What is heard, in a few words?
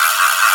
ratchet; Spanish; simon says